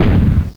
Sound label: tank fire bullet